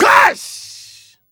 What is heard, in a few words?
Male Voice, African Voices